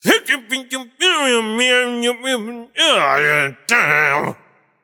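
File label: nonverbal grumble; smite; voicepack; merlin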